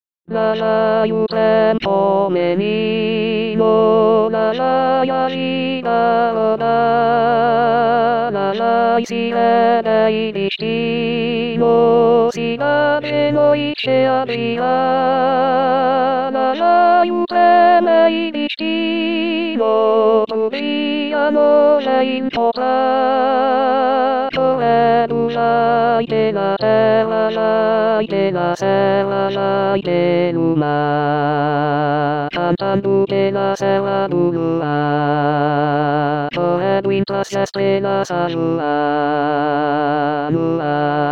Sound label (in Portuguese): O trenzinho do Caipira ten